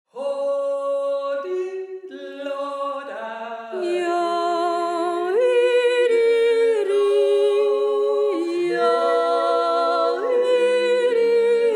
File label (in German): Sopran; Der Vom Friedl Spaun